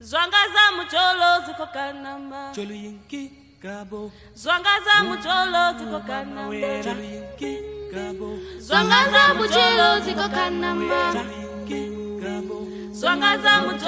Choir; Ghanama

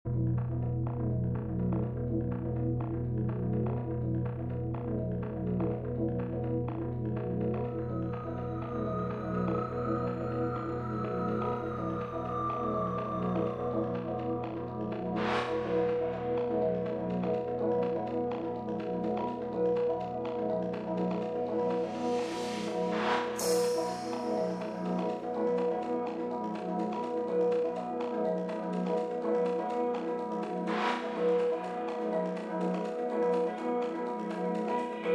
Amapiano Live Stream Mix

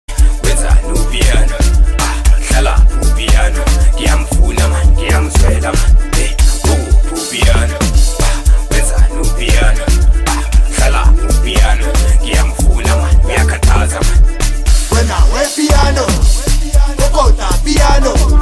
Piano Snippet

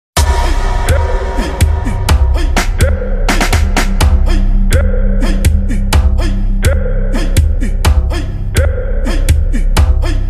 Slow Gqom Remake

